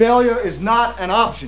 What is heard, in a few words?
lose